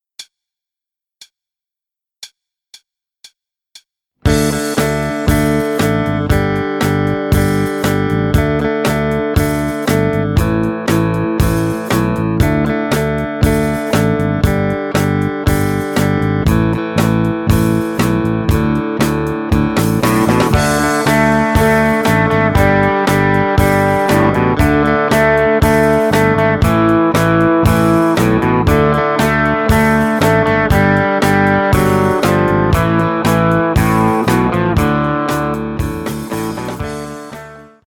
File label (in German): Pop Rock, E-Gitarre; ROCK LICKS für SOLOGITARRE